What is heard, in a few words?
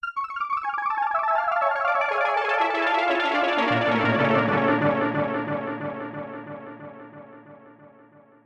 soft arpeggio